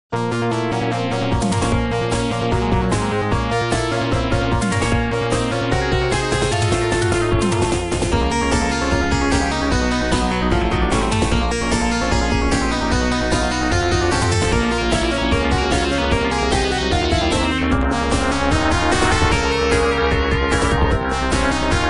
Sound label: Music Boreworm Battle; Splatterhouse 3; GENESIS